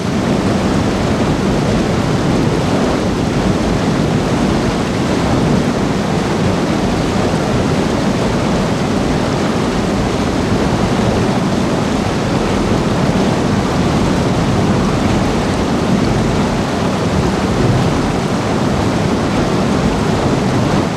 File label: submarines engines; World Of Warships